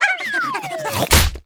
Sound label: payback; Animation Throwdown